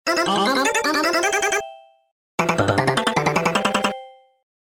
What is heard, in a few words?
sony ericsson ringtone mashup